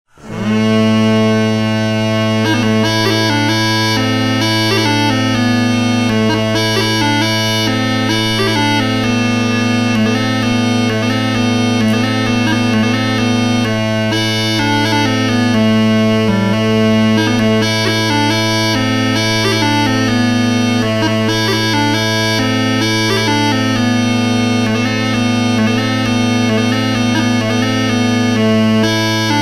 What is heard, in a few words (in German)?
Kammersack, Dudelsack